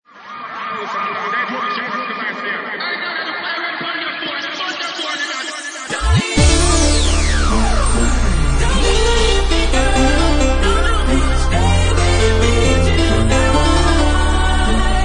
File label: Bass Package